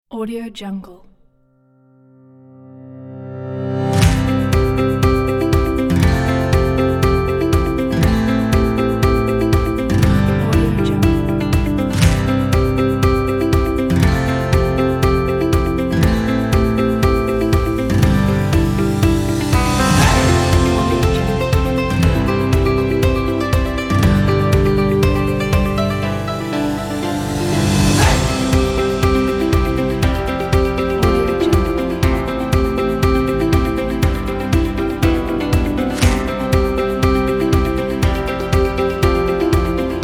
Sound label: upbeat corporate; artrecordssoundwaves